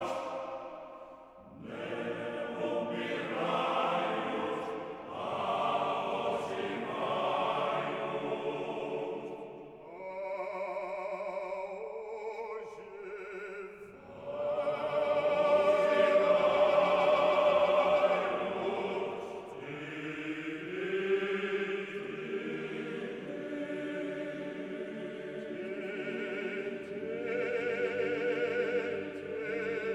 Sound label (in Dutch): Koor mannen byzantijns; Utrechts byzantijns